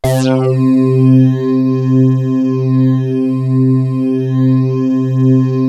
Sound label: SYN LEAD; MORPHEUS